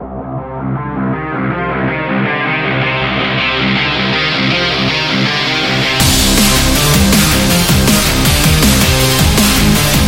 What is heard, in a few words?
This Is Rock